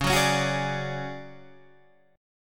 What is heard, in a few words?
D Major 7th Flat 5th, chord